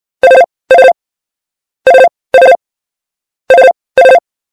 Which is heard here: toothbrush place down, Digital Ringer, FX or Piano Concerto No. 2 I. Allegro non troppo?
Digital Ringer